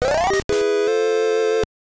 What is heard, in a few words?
Level Clear; Dr. Mario; GB